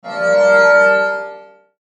Sweeper Bell Ring Dark; Production Element Imaging Element Accent Transition

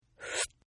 Slurp Female, Drinking

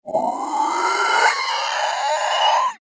horror monster scream; Monster / Creature Scream; McKinney Sound

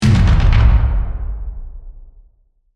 Hit Drum Reverb; Production Element, Imaging Element, Accent, Transition; BlastWave

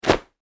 Foley Towel Snap; Snaps; Objects

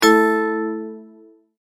multimedia tone exclamation; McKinney Sound

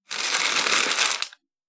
Parcel Wrapping Paper Scrunchy Noise; Paper Sound Effects; Foley Sound Effect

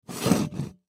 Pot Scrape, Pot Steel Pull Scrape Wood; Household; Pot Stainless Steel 3 Quarts Push Pull Fast Scrape Wood Surface; Pots And Pans

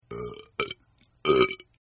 Burp; Burping; Human

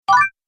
Health Powerup, video game

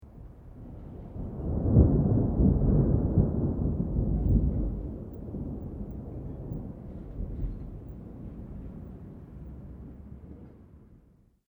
THUNDER DISTANT LOW LONG; Elemental weather effects

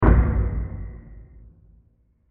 Wood Stab With Metal Bell; Bursts; Impacts; CraftedSignals

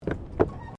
Tram Horse Drawn Step Wooden Bridge

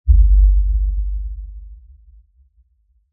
LFE Impact Bass, Drop; Production Element, Imaging Element, Accent, Transition; Deep Tone Sound Effects

